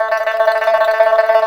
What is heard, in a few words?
PIPA tremolo A4; Spectrasonics; Heart of Asia